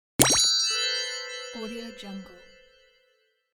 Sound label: activation